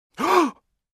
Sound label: Surprised Deep Inhale